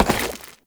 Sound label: Step Goo, Foley; Warframe; Oraxia